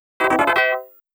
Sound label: Coin; arcade disc in jaleco variety